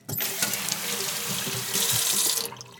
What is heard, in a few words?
Kitchen, Sink, Glass, Rinse; UberDuo; The Kitchen Sink Audio Playset